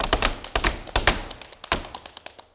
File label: STEP + CLAPS, MSTEPS+CLAPS; Best Service; World Colours